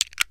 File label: Lego Click; build mode